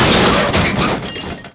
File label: car collision, heavy car collision